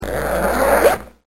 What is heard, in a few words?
zipper